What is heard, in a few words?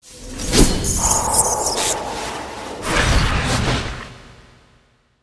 Skill Violence